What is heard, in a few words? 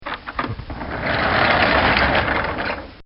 Sliding Stall Door Open